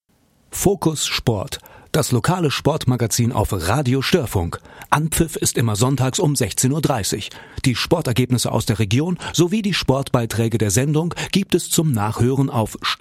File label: jingle